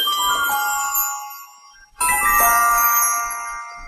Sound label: tinkle; back to the future